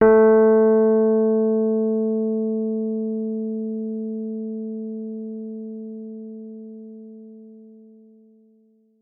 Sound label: Rhodes forte a3; Session Instruments